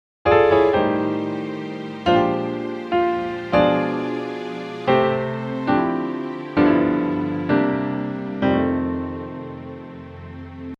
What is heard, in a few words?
Gospel Movement